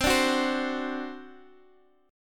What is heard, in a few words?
C Suspended 2nd Flat 5th C down Guitar Half Step Down